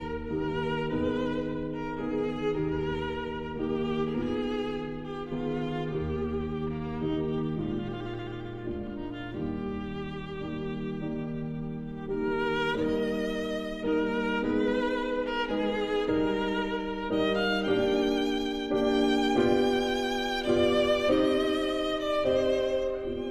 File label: Sicilienne for violin and piano